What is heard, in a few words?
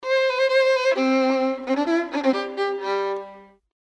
Violin